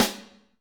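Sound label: Snare Wet, Alesis D4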